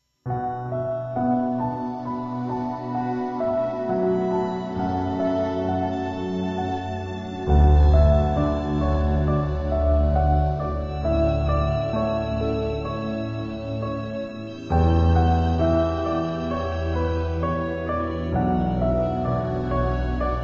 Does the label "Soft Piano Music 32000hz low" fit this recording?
Yes